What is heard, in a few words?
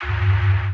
glitch; base pack